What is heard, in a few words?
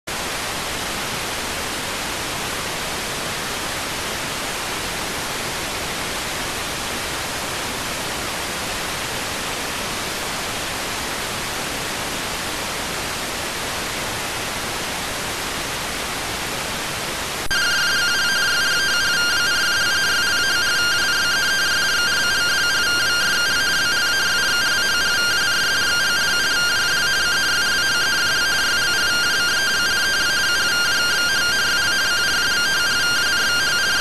rtty rx